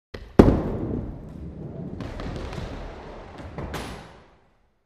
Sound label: Bowling Ball Rolls